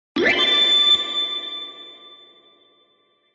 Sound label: Slide, ringtones; Sony Ericsson W995